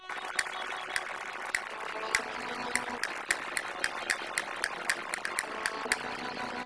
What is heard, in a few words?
Castanets Violin Applause